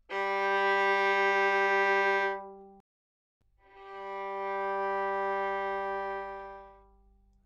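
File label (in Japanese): ヴァイオリン G3, 擦弦; 弦鳴楽器; Forte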